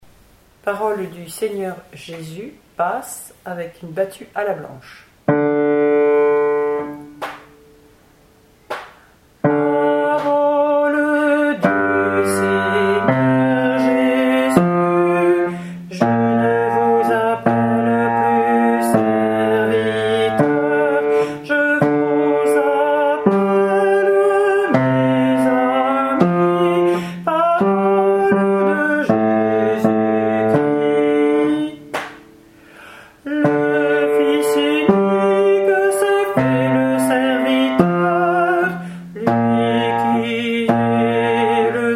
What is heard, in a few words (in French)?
Parole du Seigneur Jésus BASSE